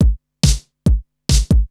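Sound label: DRUMLOOP ELECTRO 140; Techno2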